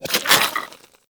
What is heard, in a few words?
Infested Throwing Star Reload; Warframe; Infested Throwing Stars